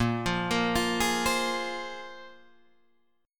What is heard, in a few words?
A sharp 7th Suspended 2nd down Guitar Drop D